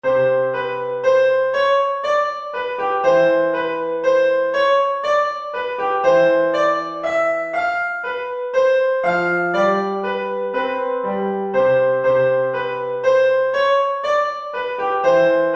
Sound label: menuett in c; mozart leopold